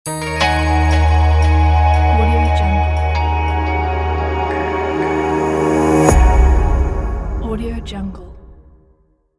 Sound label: logo opener